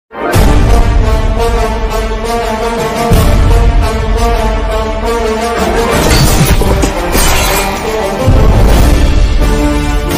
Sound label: trailer bgm ringtones; ponniyin selvan